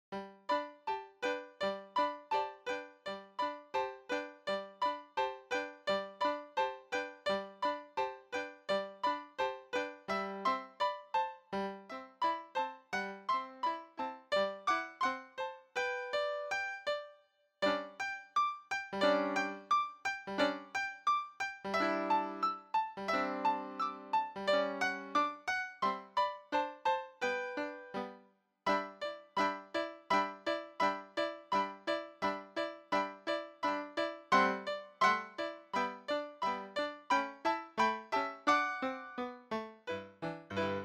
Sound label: Jingle Bells Accompaniment